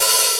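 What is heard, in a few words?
open dance hi-hat; TECHNO RAVE; Pro-Rec - Synthsonic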